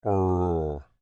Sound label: Creature Speak Groan Short, Human; Groaning; Voice; Big Room Sound